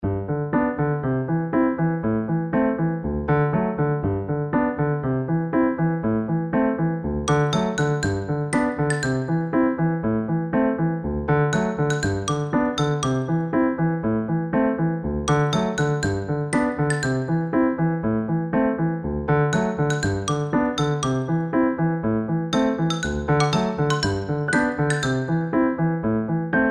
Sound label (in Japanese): BGM, 木琴